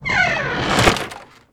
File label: barn Door Close